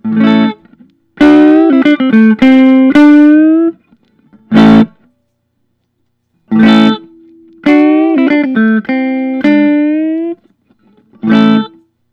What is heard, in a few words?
thorn grantura vintage natural